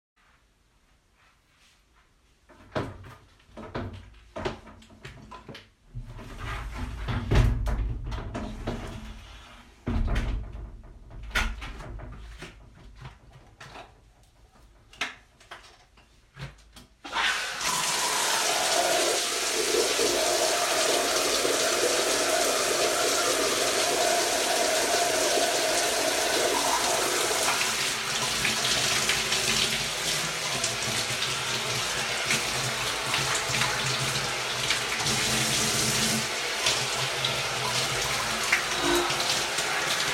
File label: Morning Shower Moans Water Bottle Sounds No Talking; ASMR; No SFX; F4A; Erotic audio